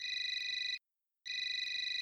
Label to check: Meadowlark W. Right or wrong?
Wrong